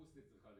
Piano A

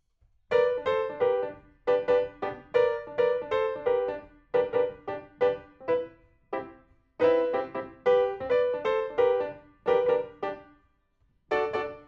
PIANO, RNR for piano

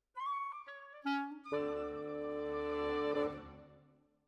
Clarinet

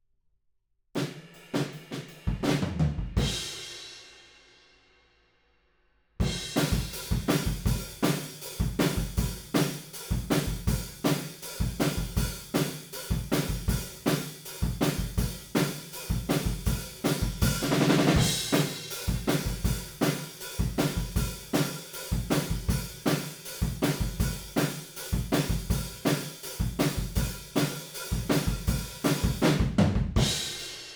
Room, 160 BPM

